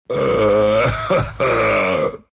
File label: laugh ru